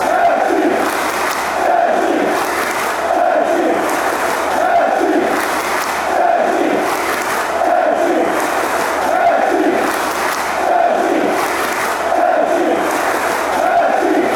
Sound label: czech republic chant